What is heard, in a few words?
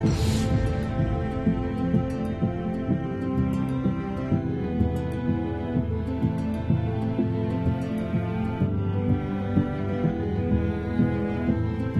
Loop Tense